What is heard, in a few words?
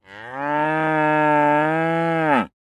Bull mooing